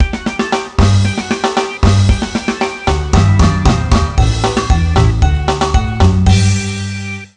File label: Jingle frog die; Frogger Helmet Chaos; nintendo ds